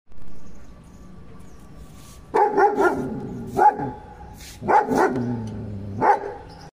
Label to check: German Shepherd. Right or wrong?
Right